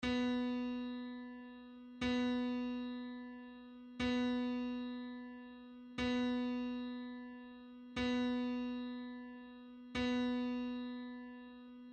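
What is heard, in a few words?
b string, guitar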